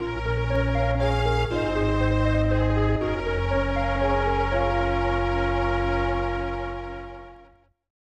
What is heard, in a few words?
Death; super valis iv; snes